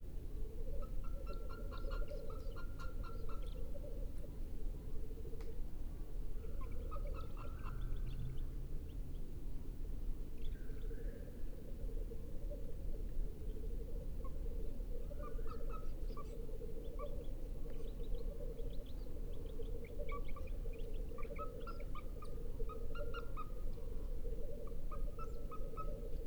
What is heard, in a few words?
Tengmalms Owl Feeding calls of female